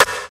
present rap steel snare drum b key, steel snare drum sound tuned to the B note